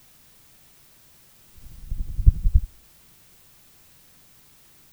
Strana anomalia

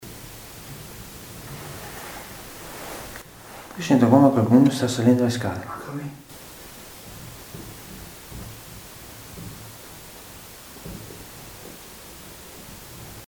Passi su scale; Bogoni

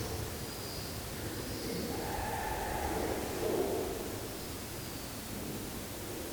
Sospiro tra i piccioni; Abbazia Vangadizza

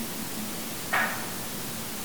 secondo colpo; Castello Monselice

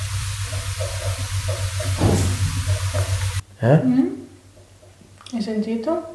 Colpo provenire dalla biblioteca

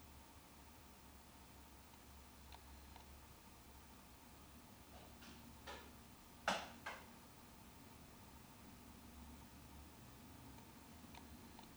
rumore di caduta oggetto; ha chiesto di fare cadere un oggetto posto davanti alla; RoccaPontedellatorreEste

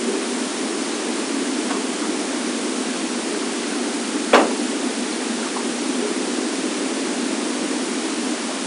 Tre colpi - il secondo forte; Castello Sanguinetto

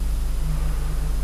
Respiro; con cuffie

